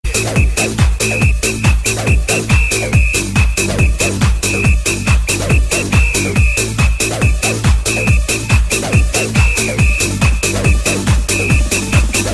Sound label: alligator whistle